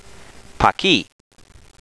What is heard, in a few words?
tap; Pah-kih'; Paki